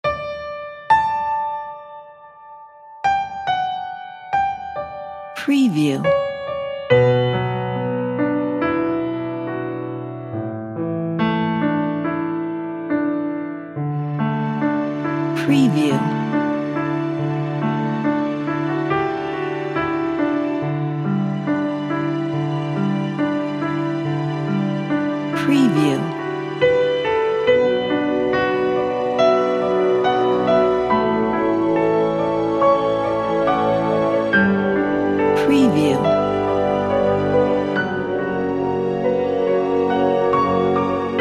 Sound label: piano and orchestra background